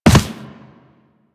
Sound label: Land Soft Default, Footsteps; Warframe; Operator